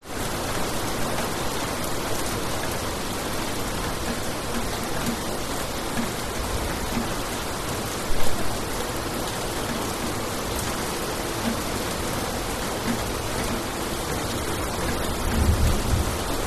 rain heavy on concrete and things distant thunders bali indonesia; lukas tvrdon